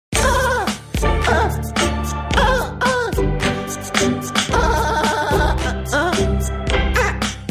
Creepy Song

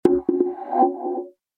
Notification

